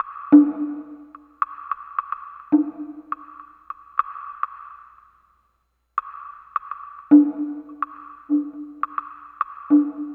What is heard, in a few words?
Percussion